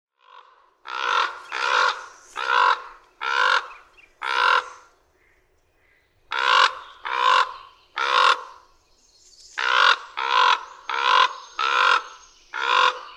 Common Raven; June 6, 2009. Big Hole National Battlefield, Wisdom, Montana.; when they both switch to a new call

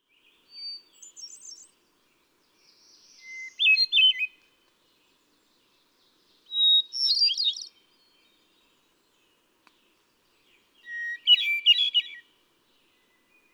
Hermit Thrush

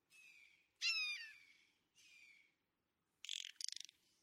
Blue Jay

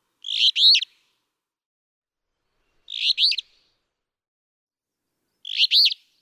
Alder Flycatcher

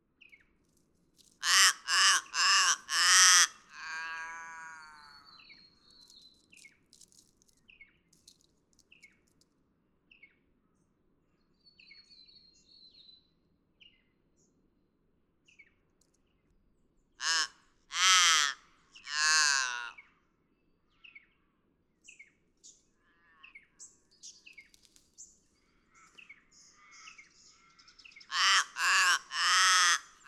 Forest Raven

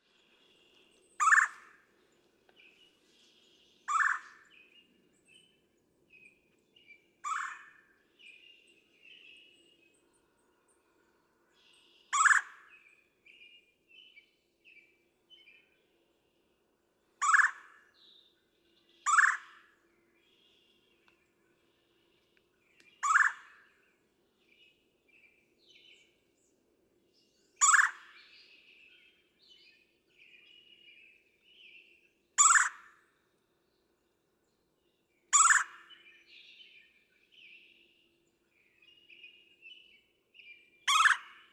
Red-bellied Woodpecker

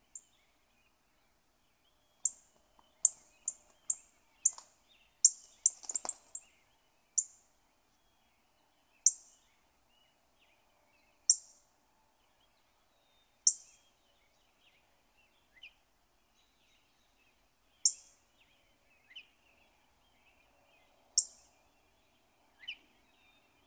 Northern Cardinal; Cardinals awaking

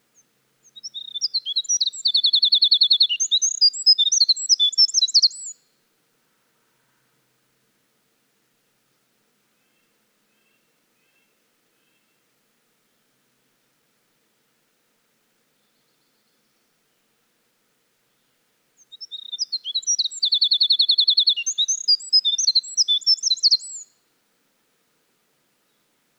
Winter Wren

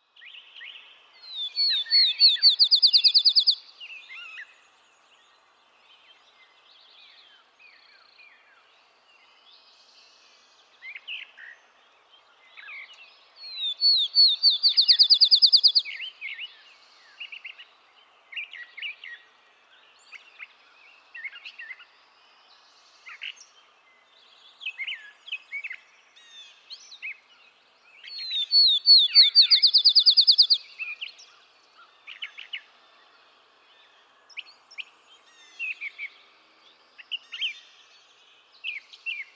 Field Sparrow

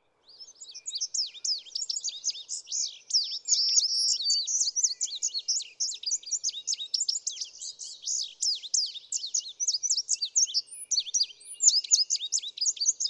Indigo Bunting

